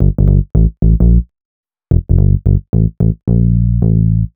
HipHop Synthbass Gmaj, Hip Hop Bass Synthbass loop; Digidesign; Pro Tools Essential Loops